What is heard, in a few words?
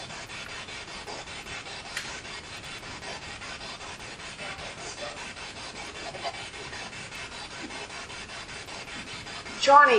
Balcony Spirit Box